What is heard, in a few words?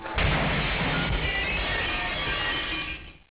breaking glass